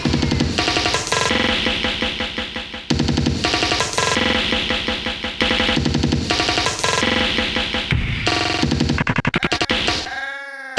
jungle

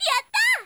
regular "yah!"; SFZ2 regular "yah!", when she does her lighting leg.; Street Fighter; chunli

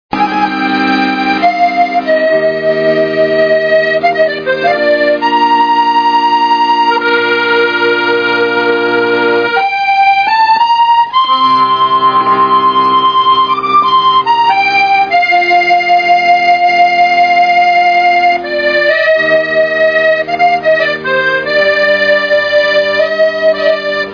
accordeon

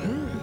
gravel

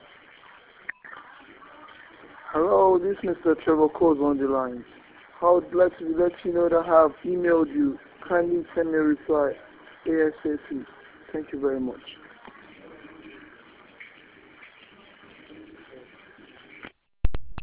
lead

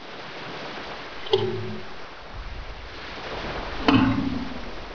ocean drip

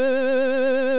vibrato

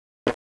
bad fart

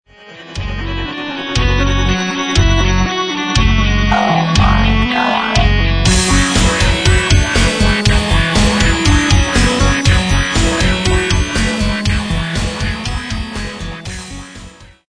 hard rock

